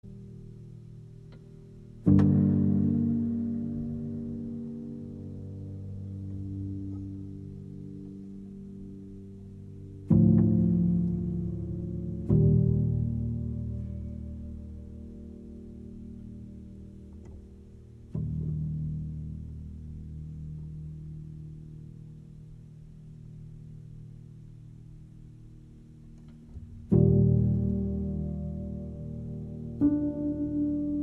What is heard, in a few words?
Piano Solo Version; Esche